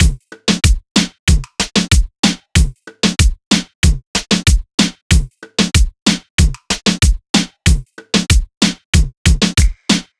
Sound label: TAIKO 94 drum loop; encima